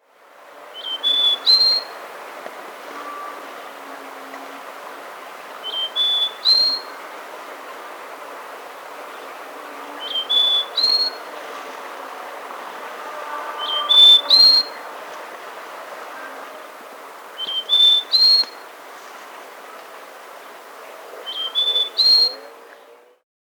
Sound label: Slaty-Backed Nightingale-Thrush (Catharus Fuscater), Andean Birds; S. America